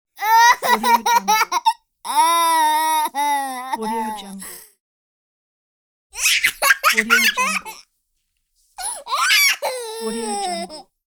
child laughter

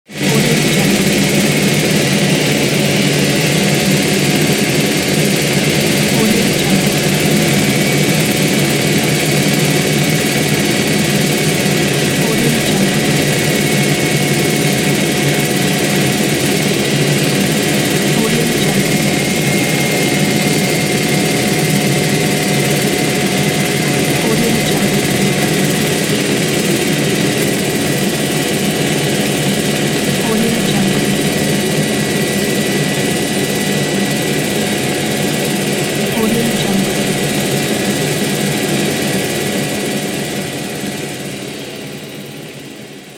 helicopter interior sound

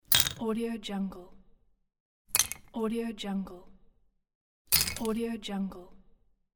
ceramic mug cup crack; domestic sounds

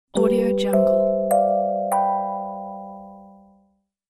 kalimba message notification; interface sounds

